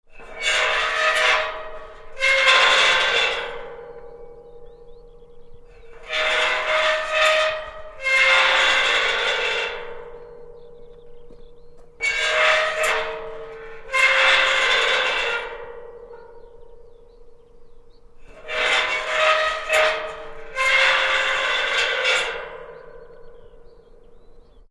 Gate Bolt Rusty Squeaks - Horrid noises from old spring bolt; Locks; Objects; Tom Hutchings